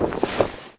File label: Sail Up; Civilization4